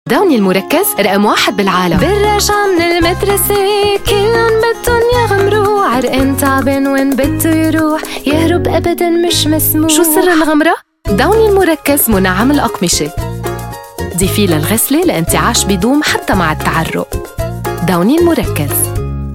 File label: singing commercial